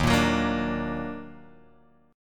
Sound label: D sharp Minor Major 7th, Guitar, Drop D; 1,1,4,3,3,x; chordshape